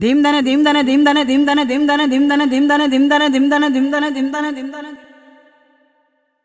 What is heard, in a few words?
Danadanaana, Vocals Female; Sonic Foundry (Sony Creative Software); World Pop